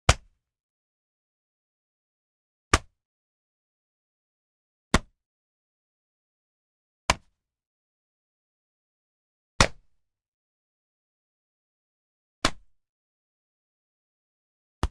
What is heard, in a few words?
Simple Punches, Martial Arts and Human Impacts; Hollywood Edge